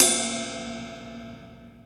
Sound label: ride single shot a key; cymbal drum samples